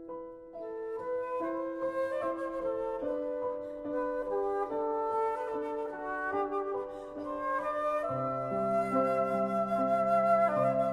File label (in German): für Altquerflöte Klarinett und Klavier